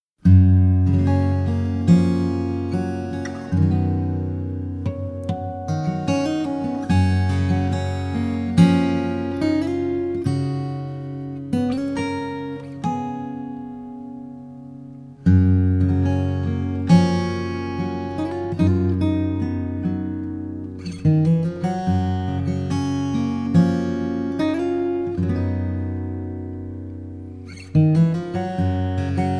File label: Hawaiian Guitarist